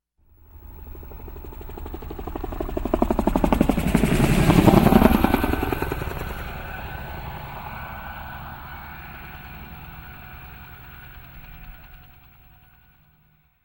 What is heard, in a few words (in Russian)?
Звук пролетающего вертолета